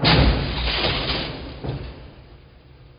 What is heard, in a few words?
door; metal bullets; doors